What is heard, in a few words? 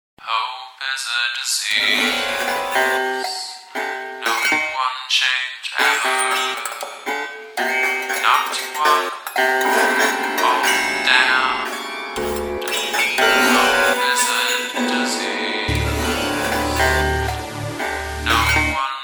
Prepared Piano Piece